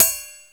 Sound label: CRACKED TRI; Dance Set 1